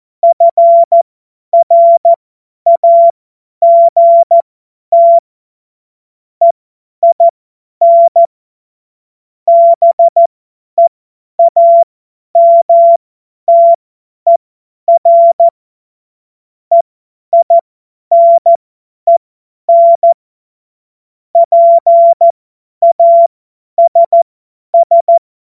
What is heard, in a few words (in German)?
Witz, cw morsen; Tempo 50 F70